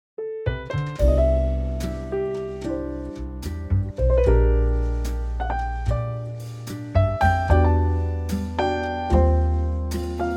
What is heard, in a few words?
C Quartet